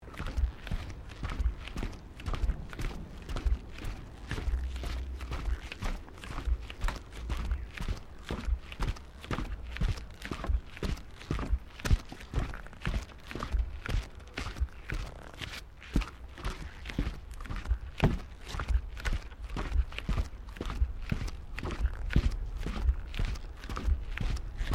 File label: Footsteps Decking Wooden Sequence; Crocs; Footstep Foley; Footsteps (Sound Effects:Human)